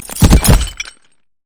emote dance; LoL; sejuani base

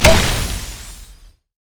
sonicwave; LoL; leesin skin05

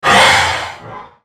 effort; CyberNocturne; Character Voices - English

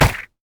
sad mummy crit attack; LoL; amumu

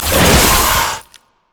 spider attack; LoL

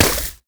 nashor attack oh; LoL; monsters

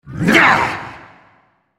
effort; Malzahar

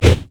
wraith basic attack; monsters; LoL

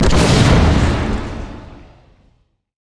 spectral; LoL; yorick

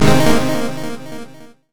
crescendo; sona; paxsona

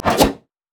red mech melee basic attack; LoL

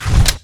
charged shot; LoL; graves

